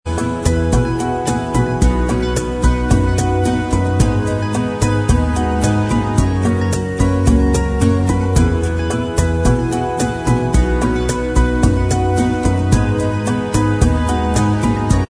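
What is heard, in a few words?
bossa mix instrumental track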